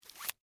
Foley, Chewing, Gum, Package, Remove, Stick, Of, Gum; Eating